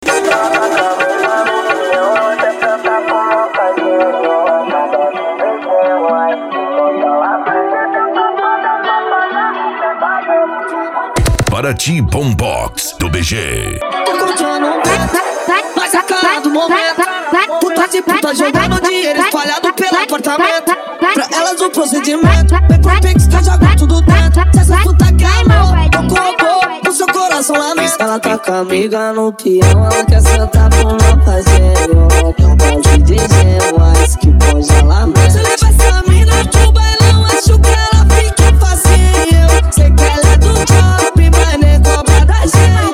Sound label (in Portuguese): bombox funk